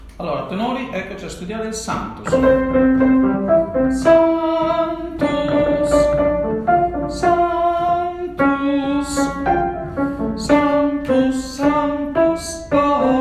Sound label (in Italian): Sanctus Tenori; Santucci; Messa del Nonno